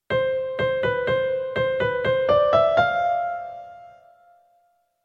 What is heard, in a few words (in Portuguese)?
polifonia